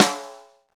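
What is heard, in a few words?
Snare, Roland; Real Snrs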